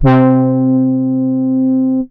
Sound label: Korg Poly 800 Brass C4